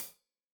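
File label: hat closed, hi-hat cymbals stereo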